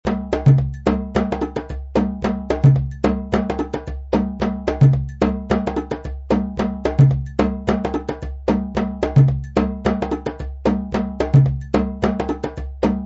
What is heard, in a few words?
coucou, rhythms